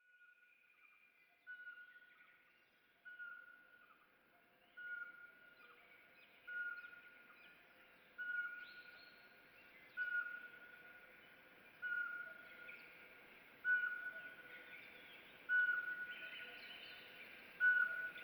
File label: pine forest